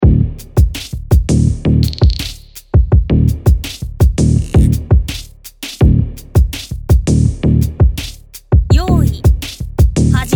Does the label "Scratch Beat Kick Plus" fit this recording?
Yes